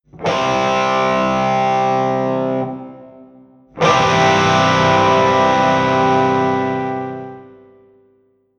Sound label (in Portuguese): Powerchord C + Harmonicos E B G, guitar